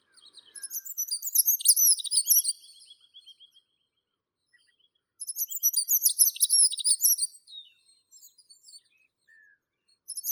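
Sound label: dunnock, Prunella modularis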